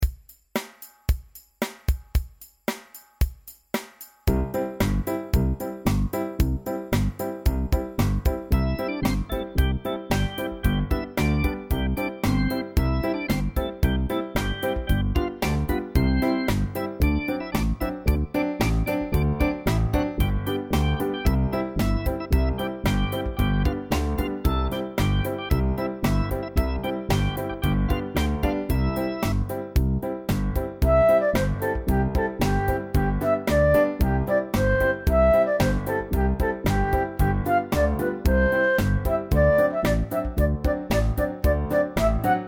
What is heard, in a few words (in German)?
hohes Playback